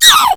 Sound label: pain; faerie